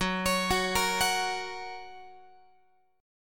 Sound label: F sharp Major, Guitar; x,14,16,16,14,17; arpeggiated